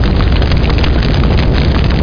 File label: fburn bg; Hexen2